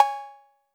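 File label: Roland808 cowbell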